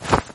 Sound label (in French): lance